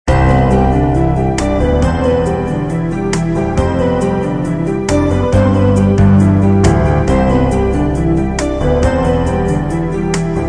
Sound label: energy in the rimshot, vaporwave 80's party; Instant Vaporwave 80's Party